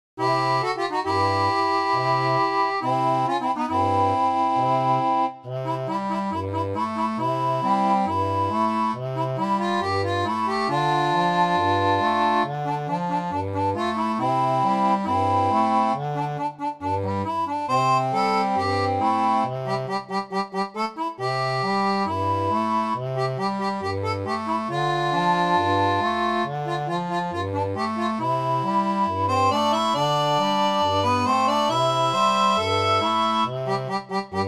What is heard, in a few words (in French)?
orgue barbarie; seraphone